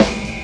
Snare, Percussion Hits, Snares; Zero-G Jungle Warfare 1, 2 & 3; 90 s Sample CDs

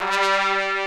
trumpet G3; Greytsounds VOL-1

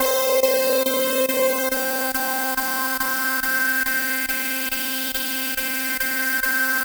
Arp 140bpm C, Trance; Zero-G Trance Inducer; Arps 140bpm; 90 s Sample CDs

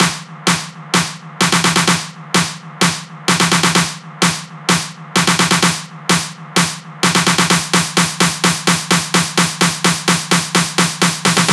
Fills 128BPM, Clubsounds

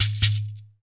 shaker, Afro Shakers, Shekeres; Roland LCDP14 Africa VOL-2

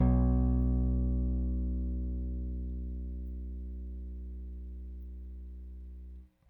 Rick Thumb A, Rickenbacker Bass; Airwindows

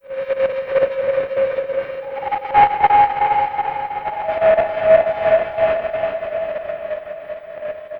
Dust Particles, Synth SFX; Sonic Foundry (Sony Creative Software); The Electronic Music Manuscript Collection

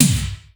SNR; Crypton - DrumBase5000; GATE KITS

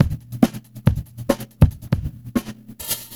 LOOP FX, Big Beat Drumloops; USB Soundscan vol.25; AKAI

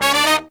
Pop & Funk Brass

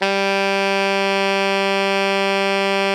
ALTO FF G 2, saxophones; Greytsounds; Philip Wolfe David Crozier's Saxophones

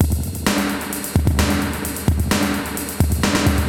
Spring Verb HR-16B (130BPM), cyborg beats; musicradar

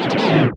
Scratching FX

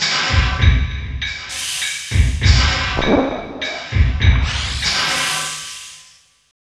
50 LARGE END 2, Industrial Loops

